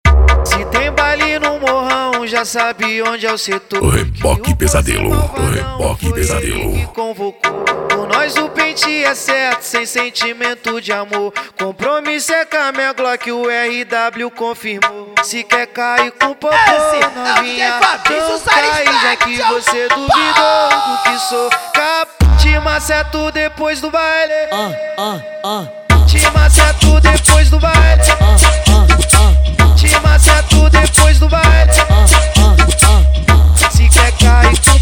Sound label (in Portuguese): sound car